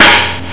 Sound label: POWW